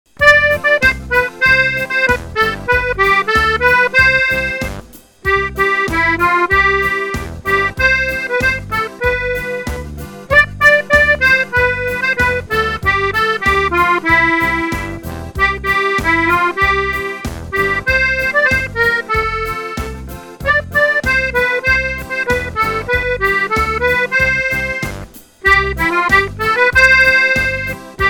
polka

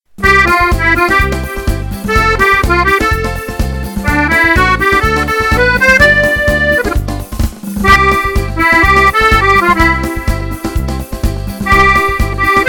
samba

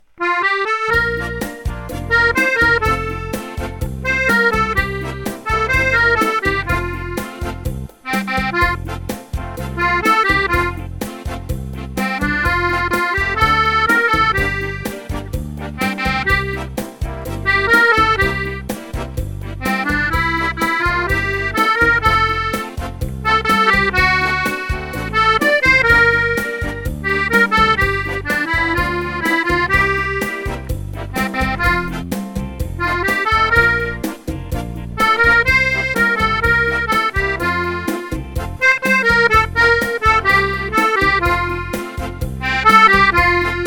cha cha

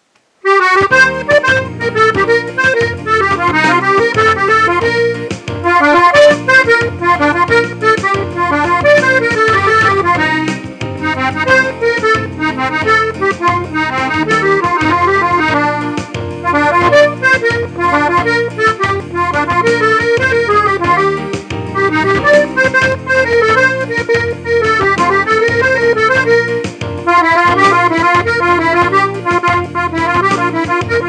cumbia

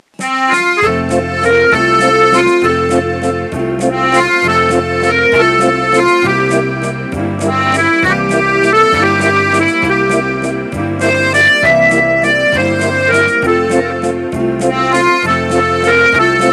valse

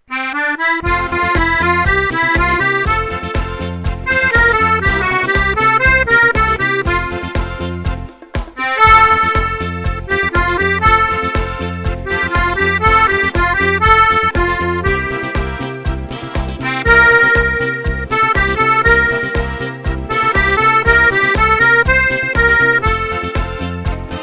accordéon, disco

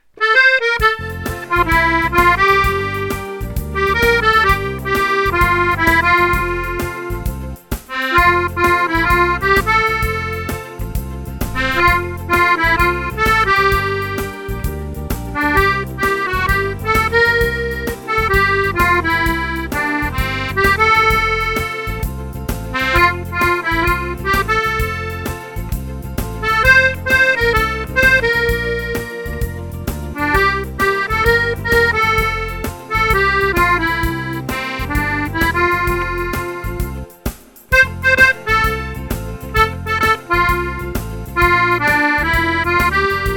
Rien qu'un p'tit air d'accordéon

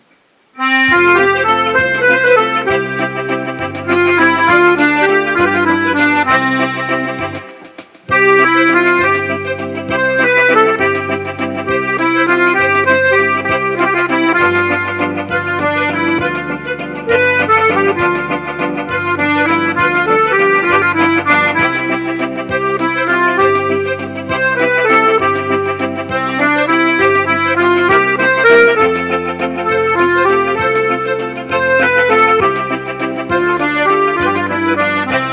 valse musette

